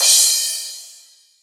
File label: long tail reverb drum crash sample C sharp key